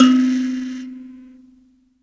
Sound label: BUZZ, Buzz Kalimba; Roland LCDP11 Africa VOL-1